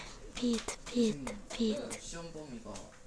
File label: beat